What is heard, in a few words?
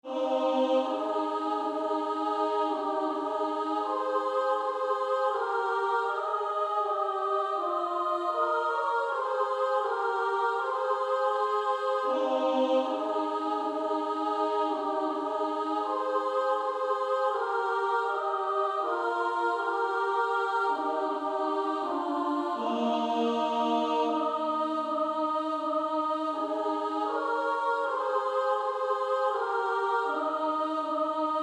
Female Choir